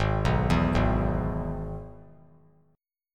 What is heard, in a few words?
Ab Minor Sharp 5th Ab down; Abm#5 arpeggiated; JGuitar